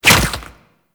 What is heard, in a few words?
Infested Lich Tendril Step; Zeke; Warframe